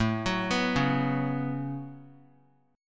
A 6th Add 9th A down, chord; A6add9; arpeggiated

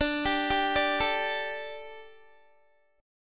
D Suspended 4th D, Guitar; Dsus4; arpeggiated

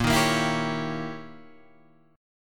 D sharp 7th Flat 9th, A sharp

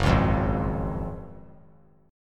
F sharp Major 7th Suspended 2nd, F sharp, down; strummed; JGuitar

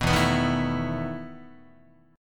E Major 7th Suspended 4th E down Guitar

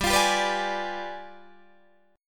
G 7th Flat 9th, Guitar, Open D

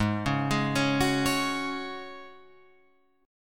Ab 7th Suspended 4th Ab down Guitar Open F, Chord; Ab7sus4 arpeggiated

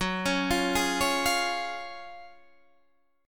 F sharp Major 7th Suspended 2nd, Guitar, Standard; arpeggiated; JGuitar

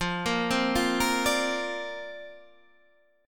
D Minor 7th Sharp 5th, F

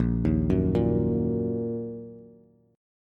A sharp Suspended 4th Sharp 5th A sharp down Bass; arpeggiated; A#sus4#5; JGuitar

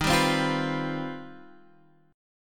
C Minor Major 11th

